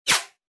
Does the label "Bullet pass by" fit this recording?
Yes